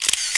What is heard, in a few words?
camera; NEC Casio MEDIAS PP N-01D